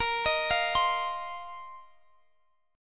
A sharp Suspended 2nd Suspended 4th A sharp down Guitar Open F; arpeggiated